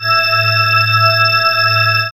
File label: SWIRL